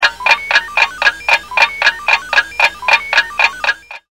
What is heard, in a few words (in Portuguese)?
RELOGIO